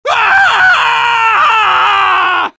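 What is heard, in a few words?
soldier pain critical death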